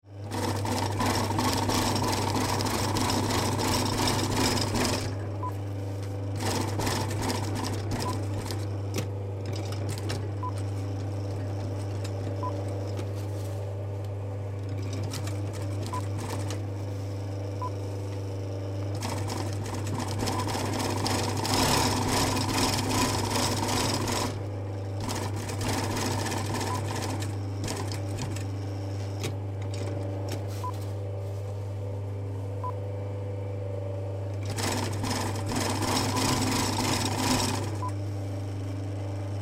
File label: Large Professional Sewing Machine